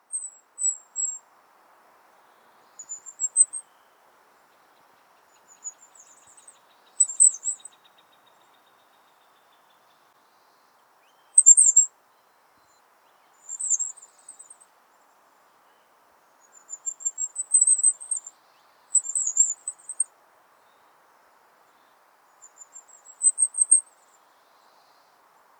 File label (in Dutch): vuurgoudhaan zang